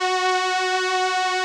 SOLINA, String Machines

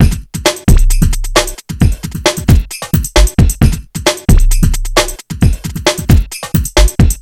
Beat, Drums

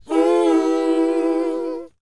WHOA D, Vocal R&B & Dance; ILIO; Vocal Planet

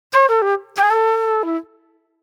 Flute

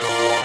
RAP STRING; Strings

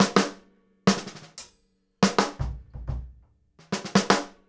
FILL, Grooves Filz Hitz

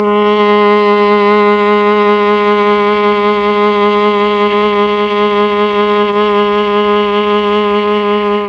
G#2 VIOLA, Mellotron; Mike Pinder - Mellotron Archive CD

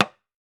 TAM TAM, African Drums; E-MU Formula 4000 Series Vol. 4; Earth Tones

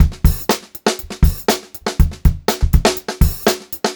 121 funky, Funk-Groove Drumloops; USB Soundscan vol.38; AKAI; 90 s Sample CDs

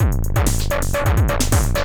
drums, Hardcore Breakbeat 129; Big Fish Audio; Hardcore Breakbeat Action; Core 129 Cmin

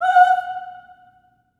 Staccato M F#5 R, Symphony of Voices; Spectrasonics

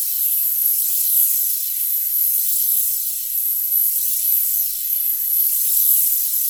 FLUTTER, FLUTTERINGS; Zero-G Ambient 1

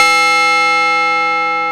CHIME; Analog Odyssey

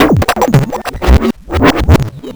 grind, EMU XL-7

